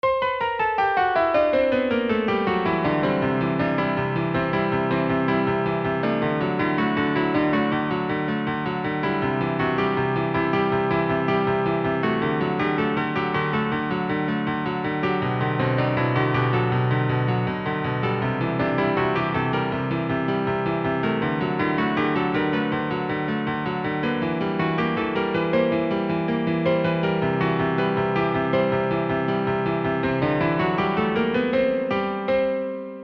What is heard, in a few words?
Do-Re-Mi; Redbull